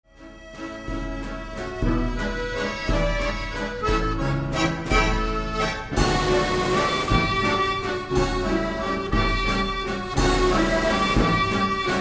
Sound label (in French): accordeon, Musique Mécanique